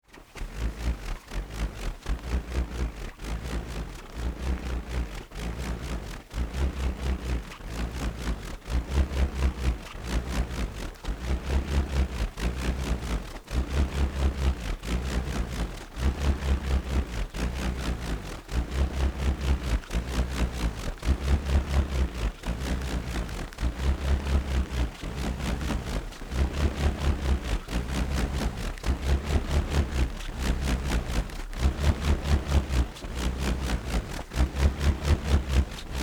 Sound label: bull roarer